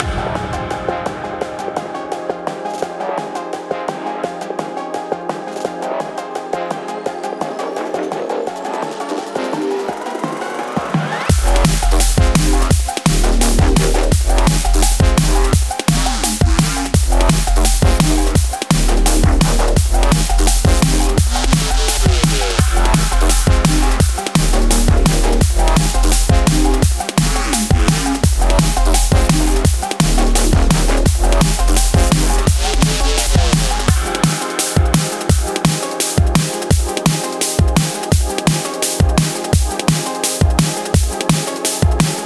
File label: Heavy Drum Bass